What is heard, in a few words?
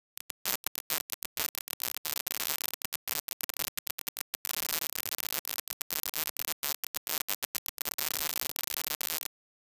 ui message